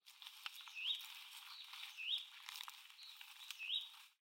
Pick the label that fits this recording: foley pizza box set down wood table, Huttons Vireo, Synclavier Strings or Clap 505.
Huttons Vireo